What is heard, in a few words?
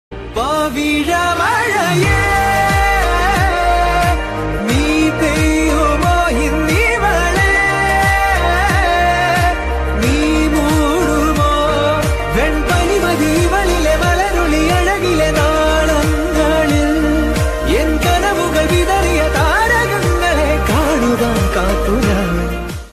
Malayalam Love Song Ringtone